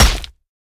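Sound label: hit weapon rock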